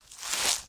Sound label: Footstep Grass